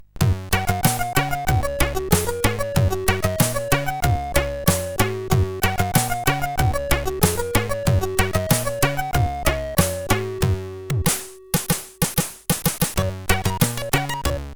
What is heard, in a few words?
Reggae, sid CSG8580R5; Signor